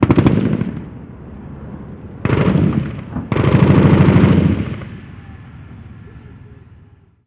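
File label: gun us assault medium; ModernWarfare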